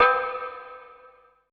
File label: MUTE GONG; BPC PERC